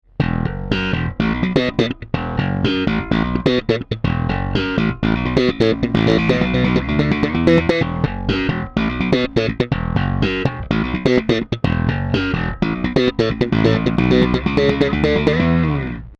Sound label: Darkglass Microtubes X7 Slap passive